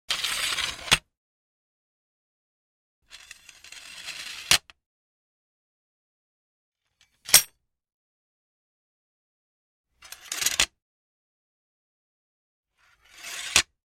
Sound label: Sword Sheathed; Hollywood Edge Martial Arts and Human Impacts